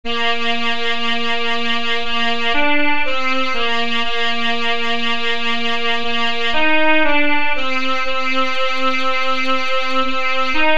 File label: alto sax